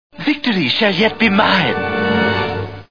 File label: victory; family guy